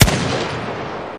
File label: Gun Sound; Gangstar; Gully Loops